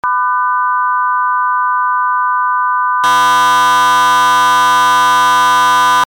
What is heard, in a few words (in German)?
Minor Chord Anregung